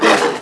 icebear attack

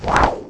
attack; comodo

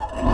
zombie prisoner move

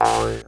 increase soil move

